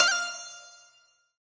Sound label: Santoor F4